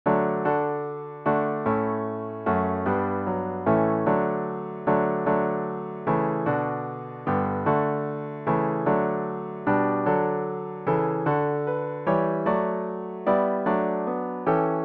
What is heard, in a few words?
piano with Intro